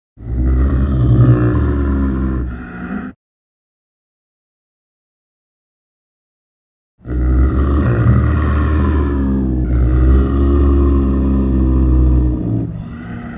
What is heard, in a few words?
snow leopard sound; animal sounds